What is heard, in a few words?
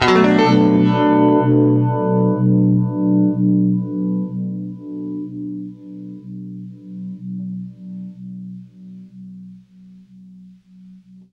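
univibe Bm7 right, guitar; East West; Steve Stevens Guitar Samples Collection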